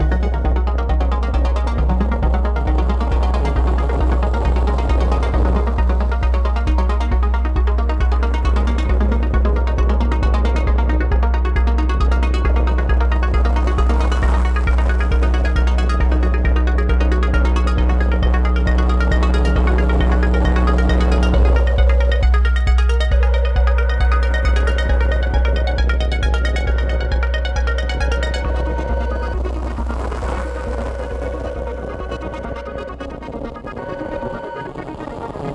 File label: Music Fast loop; Arca's Path VR